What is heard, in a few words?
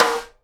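SIDE STICK, Live Snares; E-MU Producer Series Vol. 8 – Platinum Phatt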